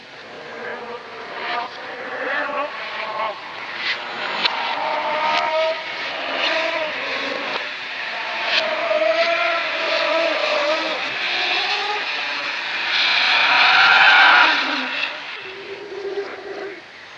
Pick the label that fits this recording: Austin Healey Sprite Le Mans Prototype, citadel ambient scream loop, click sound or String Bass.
citadel ambient scream loop